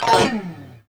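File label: chorus slide 1 L, guitars; Spectrasonics; Hans Zimmer Guitars VOL-1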